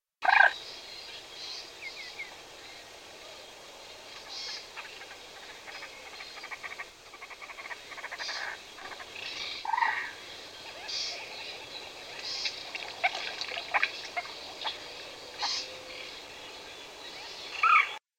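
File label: common moorhen voice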